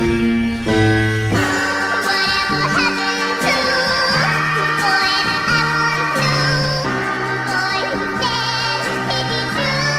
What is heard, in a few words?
tik tok song sound effect